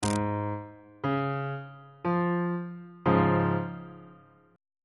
d flat major 2nd inversion on bass clef

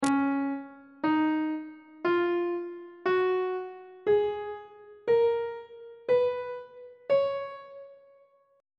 d flat mixolydian mode; on treble clef

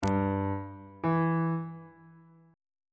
relative minor of g major is e on bass clef

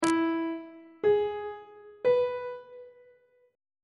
d flat minor chord 3 is f flat major; on treble clef

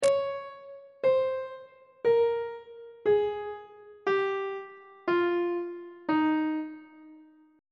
d sharp mixolydian mode descending on treble clef

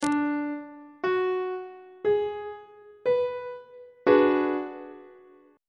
c flat minor 6th 1st inversion on treble clef

